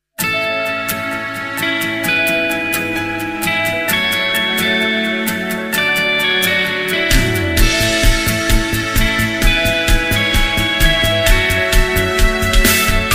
Oppo F17 Official Ringtone

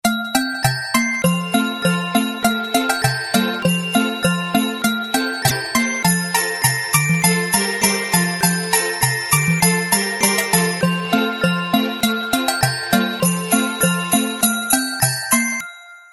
Feel Flow Ringtone, Samsung Galaxy S20 Ultra

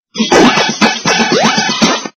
Notification Ringtone Funny

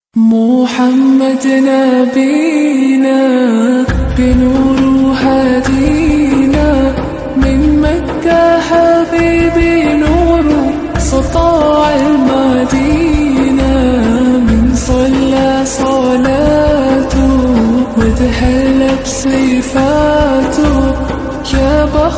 Islamic Naat Ringtone

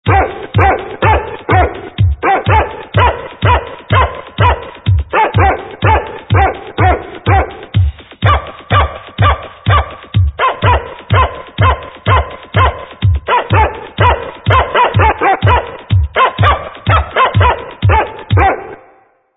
Dog Savethe Queen Disco Ringtone

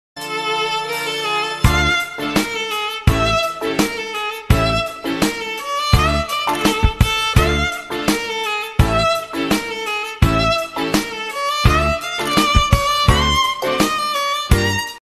Romantic Instrumental Ringtone

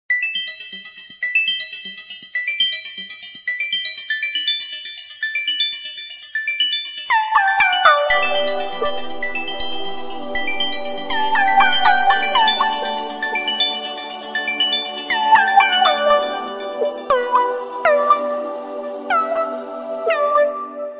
samsung new ring

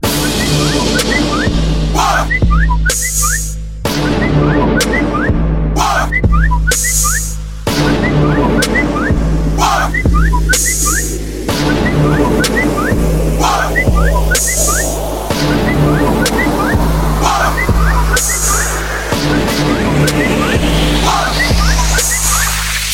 New Samsung Tone Trap Remix Ringtone

